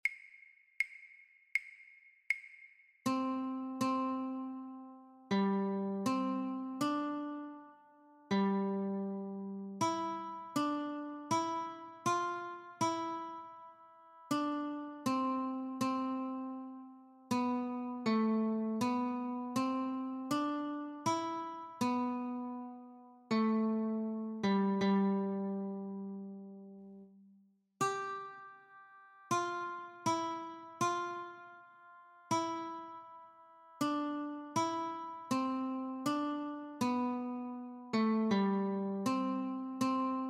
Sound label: Notes, guitar; Oh Come All Ye Faithful; christmas